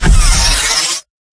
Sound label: elevator tube open